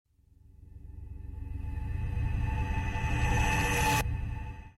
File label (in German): akzent